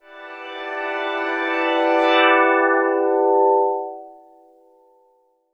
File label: Underground UK House & Electro, Pads; Sonic Foundry (Sony Creative Software)